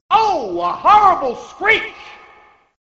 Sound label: a horrible screech